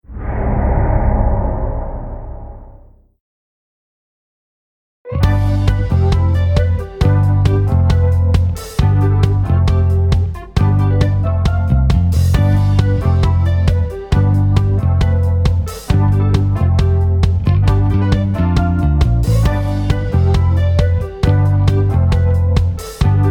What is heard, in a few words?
Lose; Ride Shooting